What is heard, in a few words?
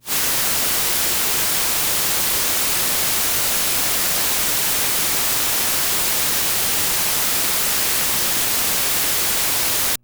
whitenoise from board mono